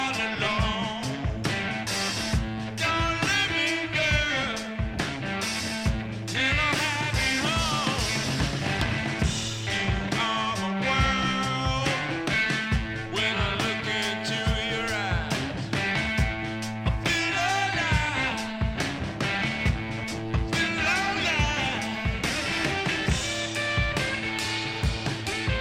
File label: Blues